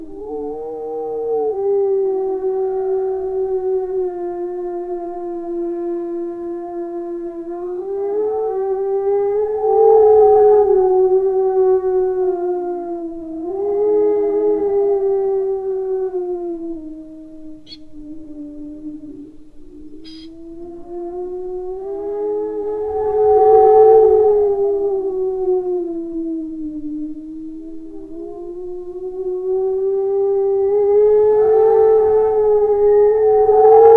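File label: worlds biggest black furry, dogs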